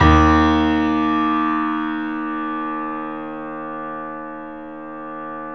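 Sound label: piano, Yamaha D#1, L; InVision Interactive - The Piano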